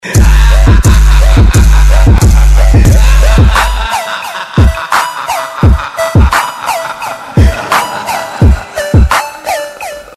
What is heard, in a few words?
horror beat, Ghost Laughing Mix; Bollywood